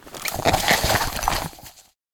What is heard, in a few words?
helmet off; STALKER; IWP